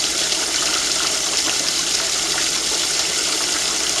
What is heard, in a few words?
water